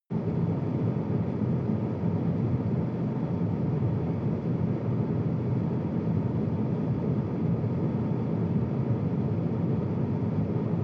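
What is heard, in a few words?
sports car interior ventilation step 9-15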